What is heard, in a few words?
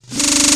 QUICK BIT, PERC SWEEPS; ILIO; Trance Fusion